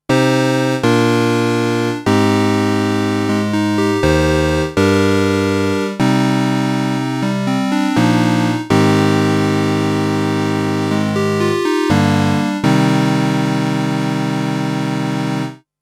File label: Boss CE-300 SEQ Juno Dry